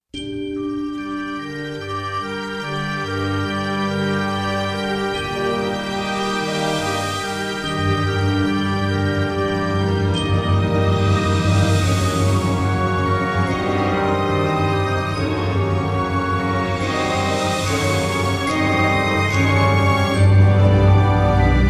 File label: No Choir